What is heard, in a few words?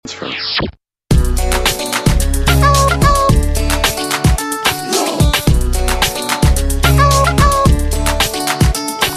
Maxi-beat